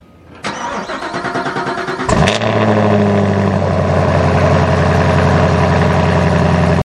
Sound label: start camaro